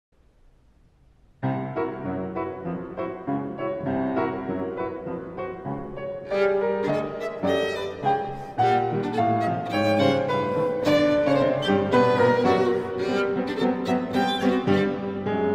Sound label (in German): Violine und Klavier